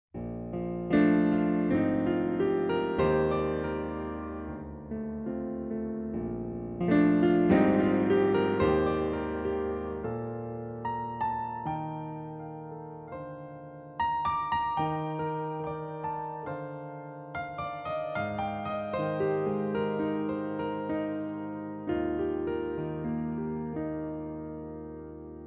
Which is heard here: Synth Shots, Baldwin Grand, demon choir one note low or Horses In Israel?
Baldwin Grand